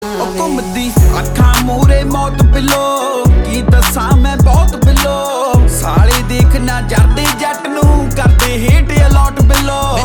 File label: Drum